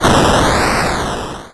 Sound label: Cry